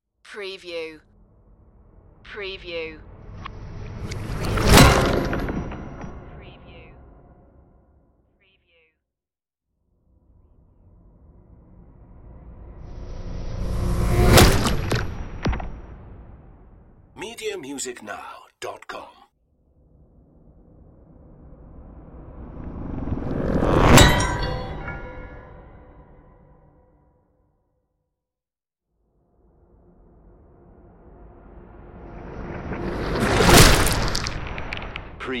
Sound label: weird whoosh impacts